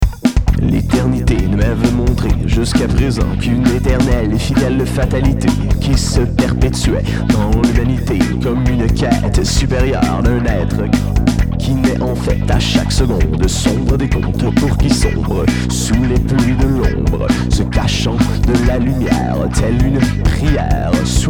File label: MOOG